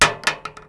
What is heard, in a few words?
metal; Mafie 1